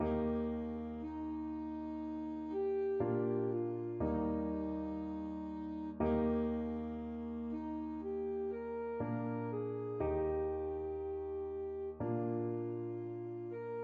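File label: alto saxophone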